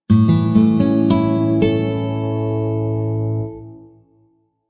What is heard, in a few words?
Harp, chime; ring2 chimepro bundle